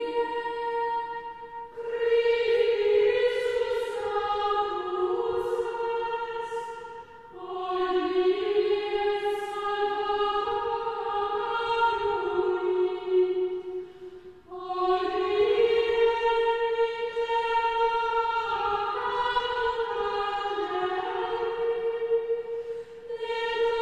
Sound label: Chant Antiphon; Traditional; Chester Processional